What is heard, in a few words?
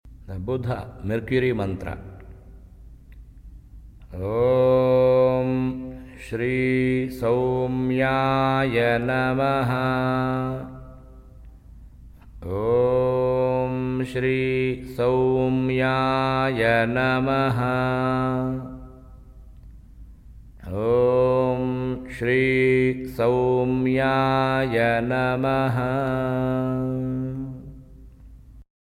Mercury Mantra